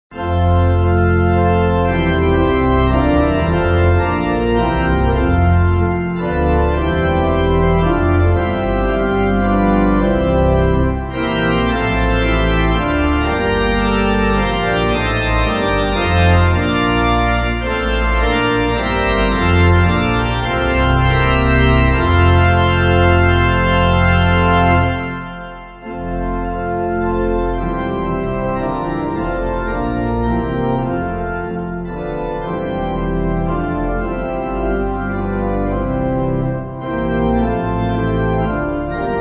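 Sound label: Pipe Organ, Music Backing Files for Churches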